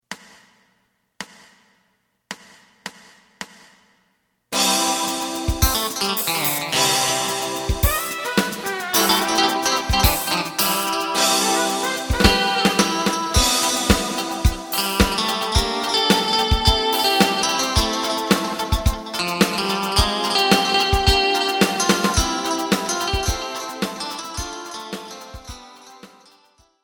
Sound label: bass